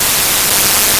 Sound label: noise Am